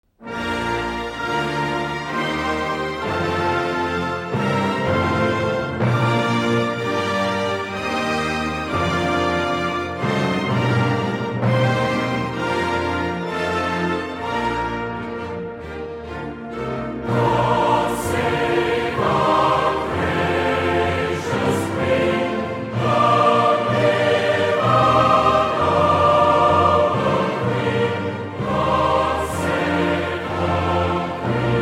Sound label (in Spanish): Himno Nacional de Inglaterra